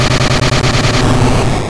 Selfdestruct Start

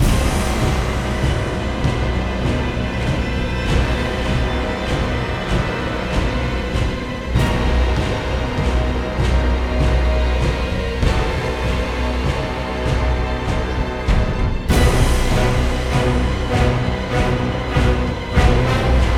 Bossfight Frantic; ryse son of rome 2014

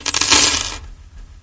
Coins; guns n zombies 2014 pc

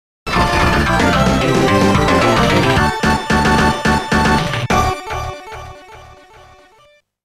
All Clear; Gunstar Heroes Sound Collection

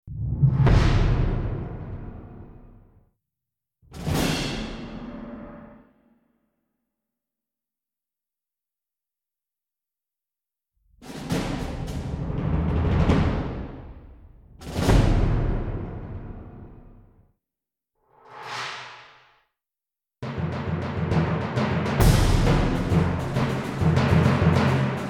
Perc; Front Mission Evolved; Mahattan 2 Perc

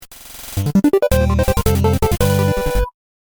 Fanfale; Cave Story

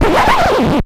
Misc Skid

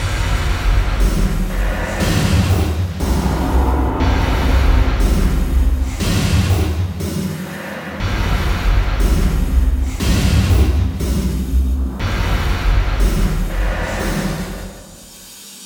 Danger Tonal; Insanely Twisted Shadow Planet

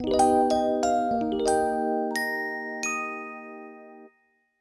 JINGLE GOODNIGHT; Harvest Moon Skytree Village; nintendo 3ds

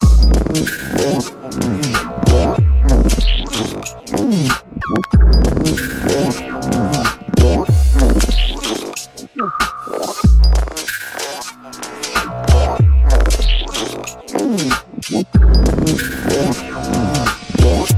big lead; naught reawakening

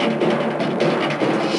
Desert Percussion

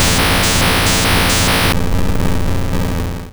Tri Attack

